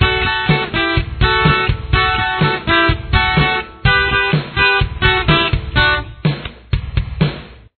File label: guitar